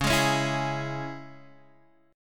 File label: C sharp Minor C sharp Guitar Standard, chord; strummed; x,4,6,6,5,4; JGuitar